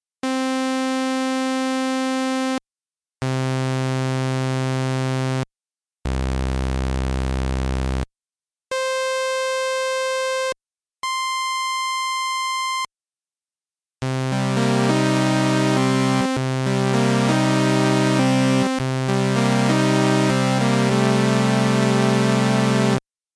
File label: sawtooth wave